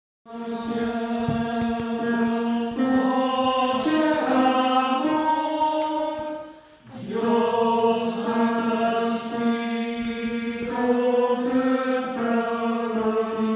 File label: Tenor; El Nacimiento